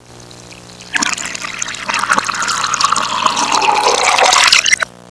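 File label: down drain, casa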